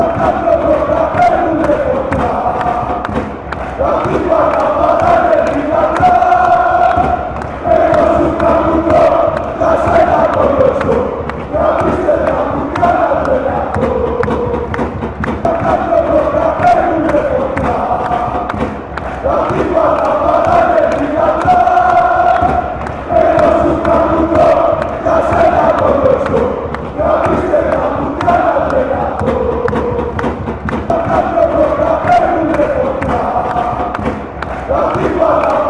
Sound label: chant, fanchants; olympiakos